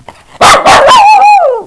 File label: howl